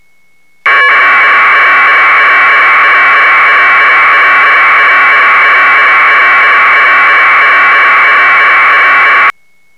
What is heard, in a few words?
25 packets flat audio, packet radio